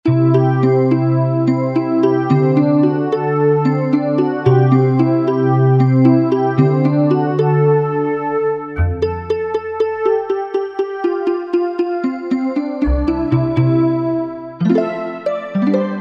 Pluckrimba, Korg Wavestation

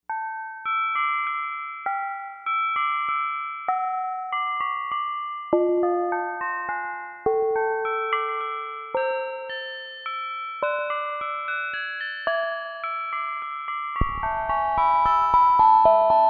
Old Bells, Novation UltraNova; Factory Patches

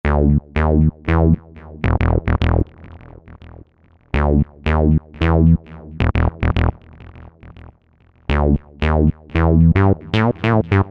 West End Bs

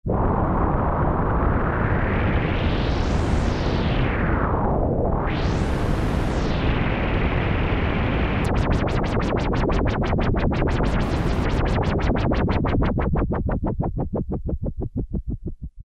pink noise, Korg M-500 micro-preset

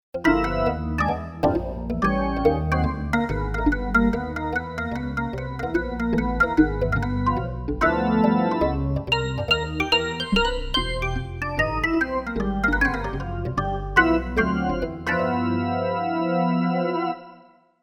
Jazz Organ, Roland JV-880; Factory Patches

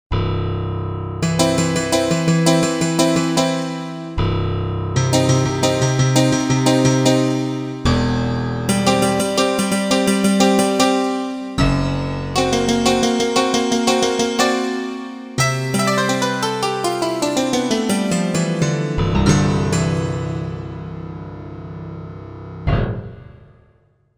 Piano With; Korg MSC-1S Variety

